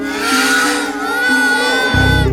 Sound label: Roar, baby; nessie